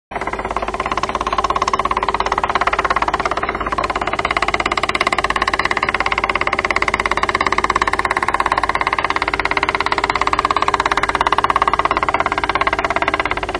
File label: woodpecker